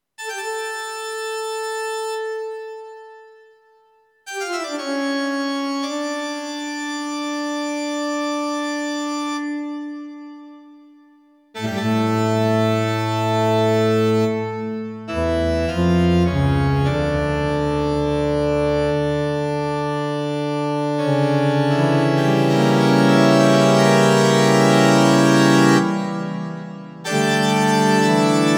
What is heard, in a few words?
St Marks Organ